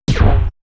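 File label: Turntable; AMG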